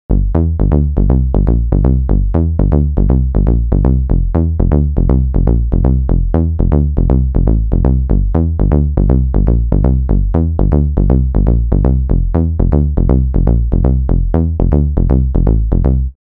Bass mono

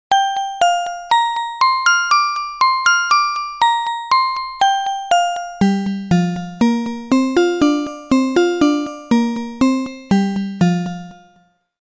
Analog Synthbell mono

